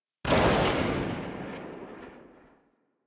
Quill Dart Gun Tail; Warframe

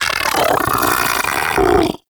Arachnoid Vocal Patrol; Enemies; Vocals; Warframe; Corpus; Arachnoid Hunger

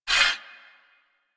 Cargo Train Exit Bolt Slide B; Warframe; Corpus Railjack

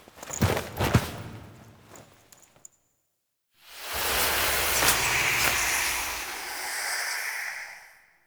Foley; Warframe; Quests; The New War; Zariman Void Kid To Drifter Reveal

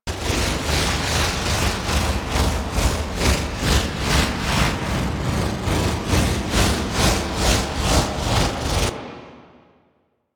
Rotating Puzzle Loop; Ambience; Orokin Moon; Warframe

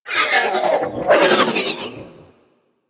Sentient Ship Roll; Warframe; Enemy Ships; Space Fighter Sentient Coil Agent

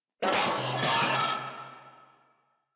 Moa Vocal Enemy Spotted, Enemies; Warframe

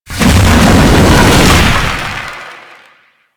Space Fire Debris; Ambience; Space Battles; Warframe

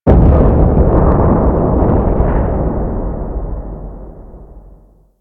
Fortress Distant Asteroid Impact; Ambience; Warframe; Grineer Fortress

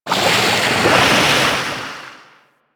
Shark Tail Move Slow; Warframe; Grineer Ocean Shark

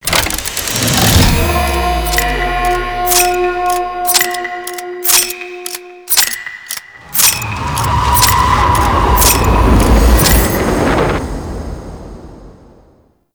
War Clock Finale; Tau; Twelve Minute War; Objectives Meta

